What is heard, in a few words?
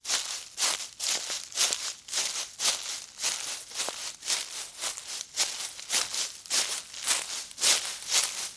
walk fallen leaves